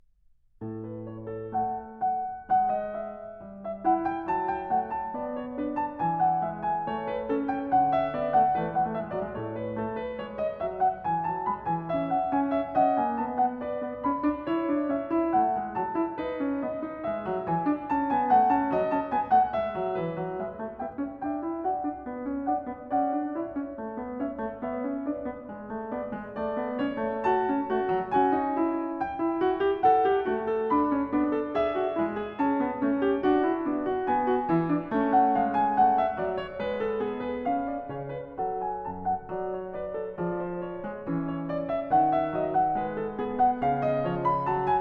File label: Prelude No. 19 in A major; Well-Tempered Clavier; BWV 864; Bach; Book 1